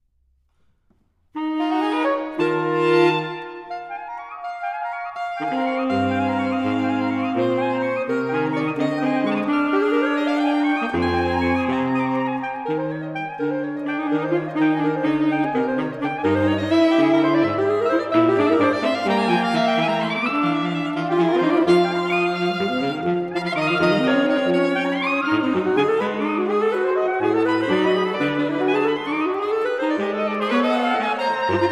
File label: saxophone quartet; Lyrics (3) for saxophone quartet